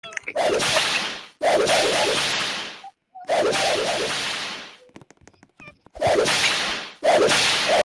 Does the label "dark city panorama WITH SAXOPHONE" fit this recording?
No